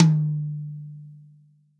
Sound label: REAL DRUMS POP KIT; Best Service ProSamples vol.43 - Real Drum Kits